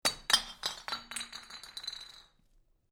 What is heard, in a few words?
Glass Bottle Drop Bounce, A bottle falls and bounces on hard su; but does not break. Multiple clinks as bottle bounc; A bottle falls and bounces on hard surface, but does not break; Bounce Sound Effects; Lynne Music